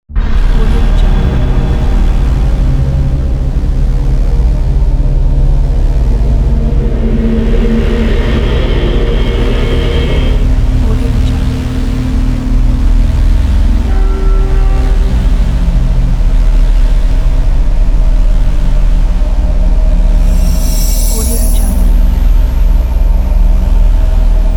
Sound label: dark horror mystery ambience soundscape